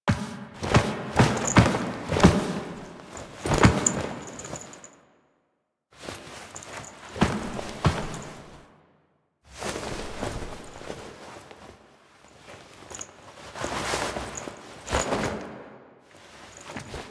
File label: Intro Foley Default; Archon Death; Drifter; Warframe; The New War